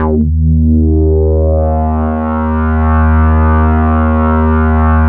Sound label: DIVE, ARP 2600; Big Fish Audio; First Call Vintage Keyboards